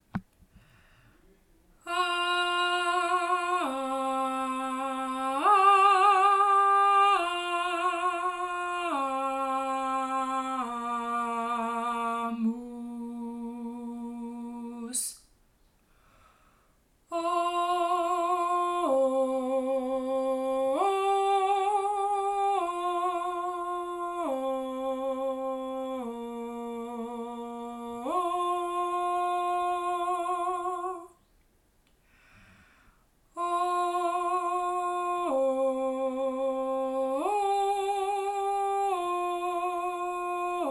Benedicamus voix grave

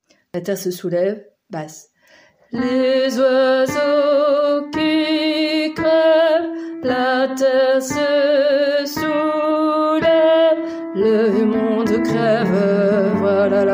la terre se souleve basse

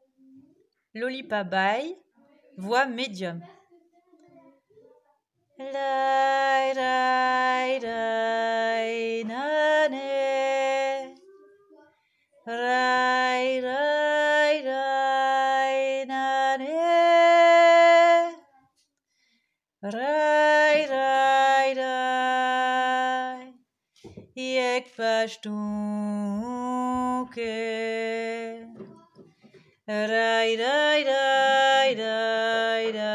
Loli Medium entier

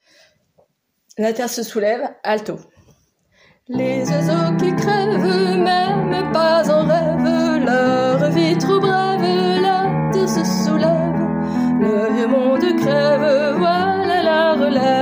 la terre se souleve alto

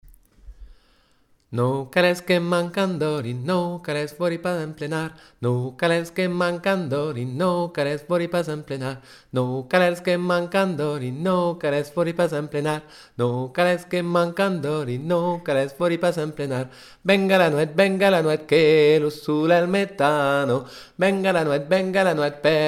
voix principale

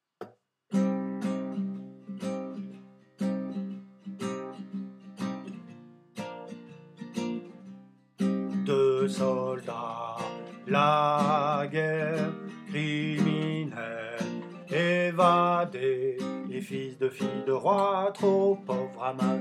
Vx Basse

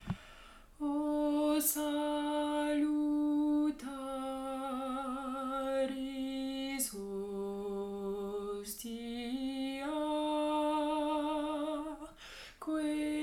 O salutaris basse

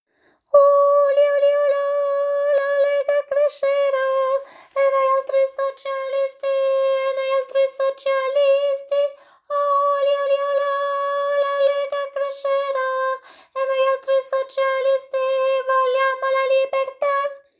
La lega soprane fin 2eme fois

